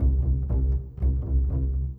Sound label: Bass, Orchestral; Sonic Foundry (Sony Creative Software); Orchestral VOL-2; Modern 11